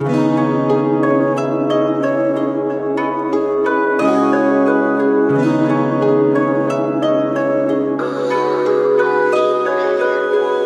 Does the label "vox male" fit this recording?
No